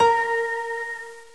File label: piano